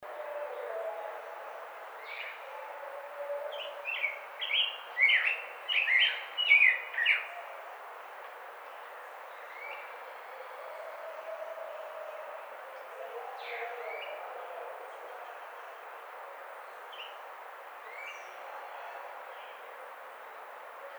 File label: Baltimore Oriole